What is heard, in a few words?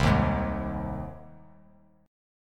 C Minor Sharp 5th, chord; strummed; JGuitar